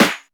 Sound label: snare sample a sharp key; dope